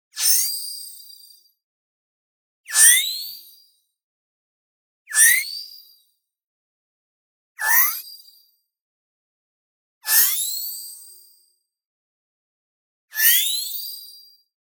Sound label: BLING Quick Shing; boom library; Anime Essentials